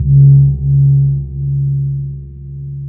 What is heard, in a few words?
SUWUK GONG, Bronze Gamelan; E-MU Formula 4000 Series Vol. 4; Earth Tones